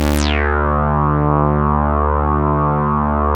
saw res dry, synth; Keith Emerson Signature Series

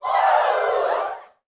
SHOUTS, Gospel

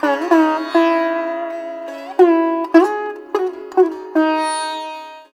SITAR; Spectrasonics; Heart of Asia

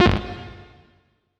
SHORT, SHORTNOIS

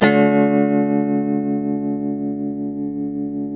TEL TM D MAJ D#, TELE CHORDS, Guitars; Spectrasonics; Hans Zimmer Guitars VOL-1

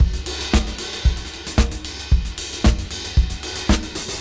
113 BPM; AMG; Keith LeBlanc Phat Butt Jams

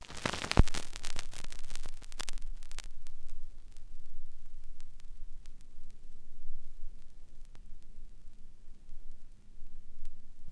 vinyl; Masterbits; Vinyl Attack

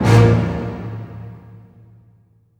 HIT, orch hits; Orchestral Loops

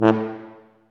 TUBA C1, Solo Brass, stacc; Roland LCDP12

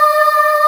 choir; Greytsounds; Scott Peers Sound Engineering

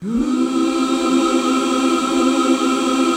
PAD, Dance Mega Synth

